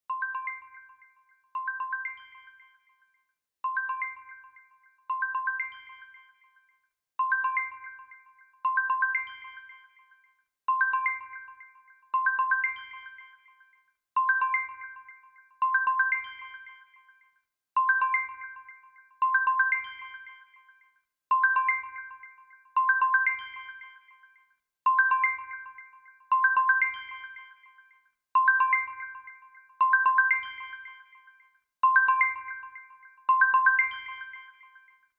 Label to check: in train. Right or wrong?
Wrong